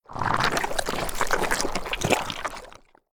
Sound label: Zerg Creep Tumor; sc2